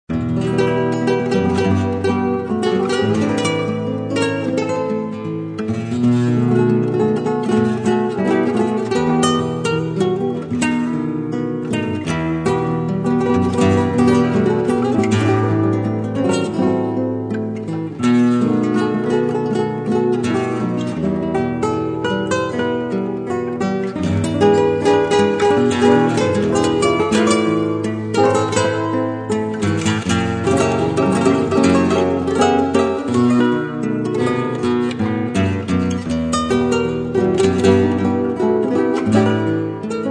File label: Gypsy Guitar